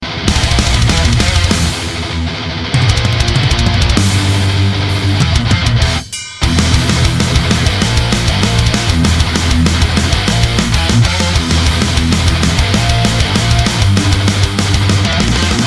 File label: Death Metal